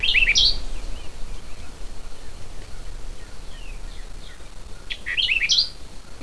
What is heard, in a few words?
White-eyed Vireo